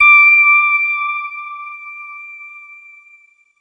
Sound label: long bright tone bell; Bells